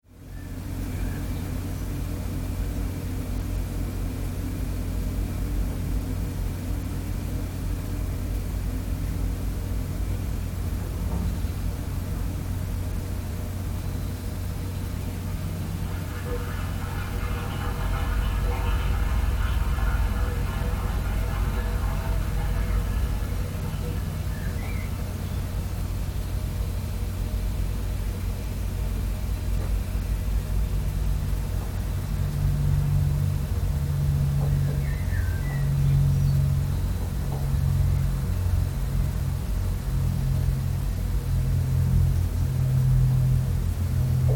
Hotel Room Atmosphere, Air conditioner very background birds; good buzz for post sync cover; Tom Hutchings